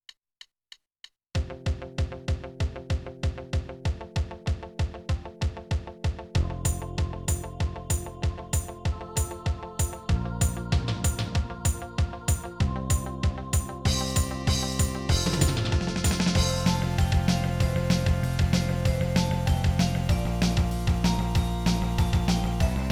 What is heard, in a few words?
Jingle Bell